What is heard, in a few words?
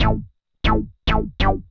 SNTH BASS ELCTR 140 A, Techno